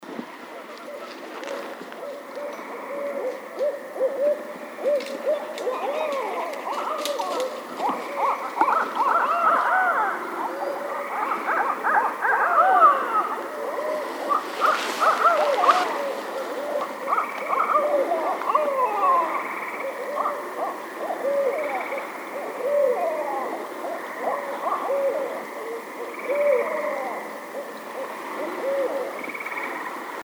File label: Barred Owl